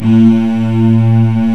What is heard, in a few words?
STRING ENSMB; Greytsounds VOL-1